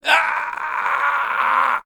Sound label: male scream; npc; DeadFright